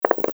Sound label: dice roll; craps